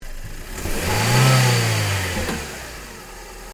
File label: Rev Engine Bay, car; Hyundai Accent 2008; Hyundai hire car; Vehicles